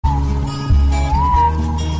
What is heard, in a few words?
movie song music sound notification